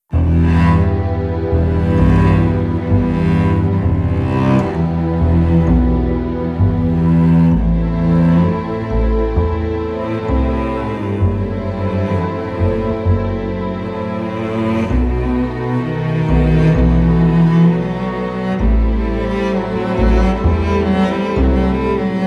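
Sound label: Expressive Orchestral with Percussion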